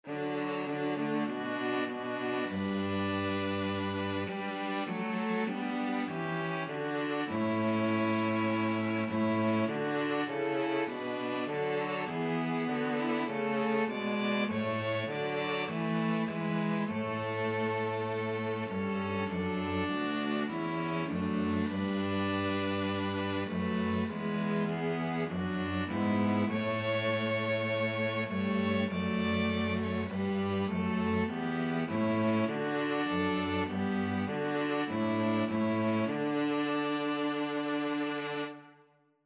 crown him, string trio

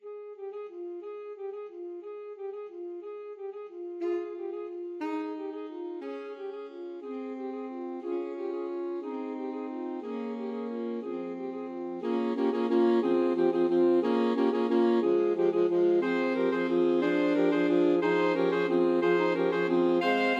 ASAX quartet, alto sax quartet; carol of the bells

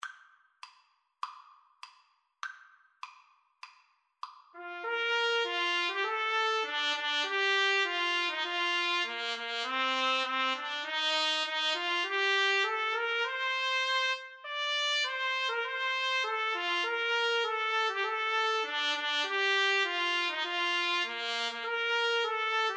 national anthem russia, Trumpet French Horn Duet

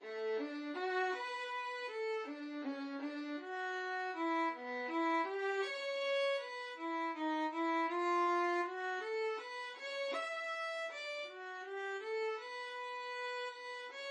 violin

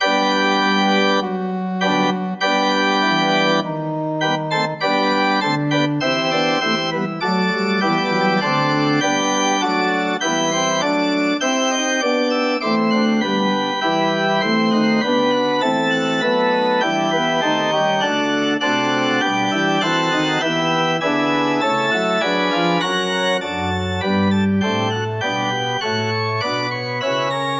nun danket, organ

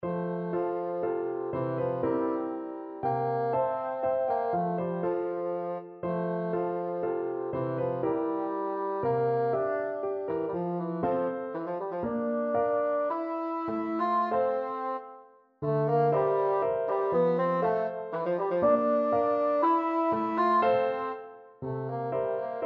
bassoon

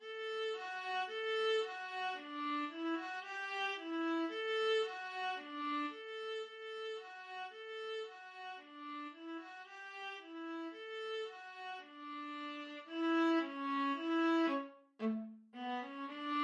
oranges and lemons, viola

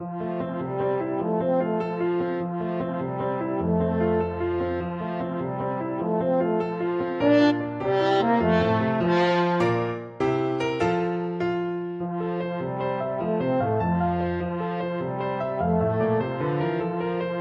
pop goes, french horn

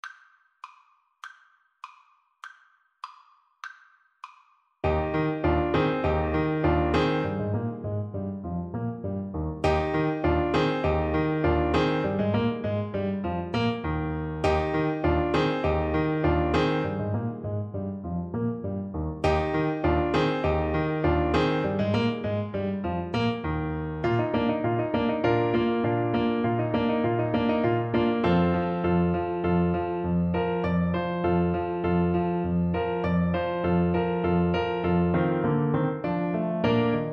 musette, Clarinet; bach

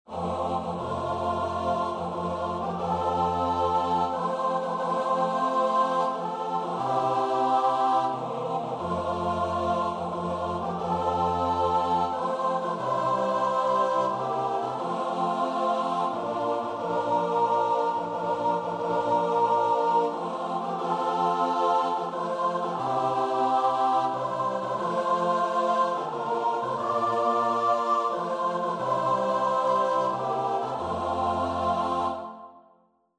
joyful sound, choir

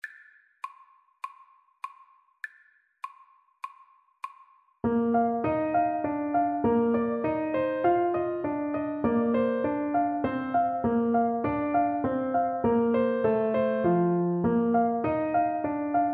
horn, French Horn

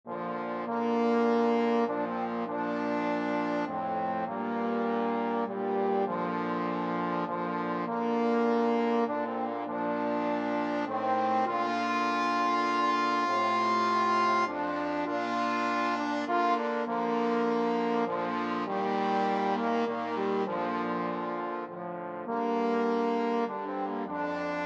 amazing grace trombone

